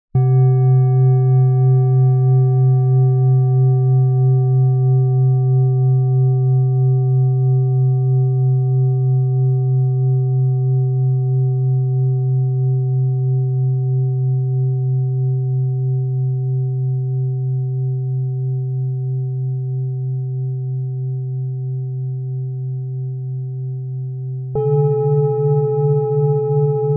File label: Strike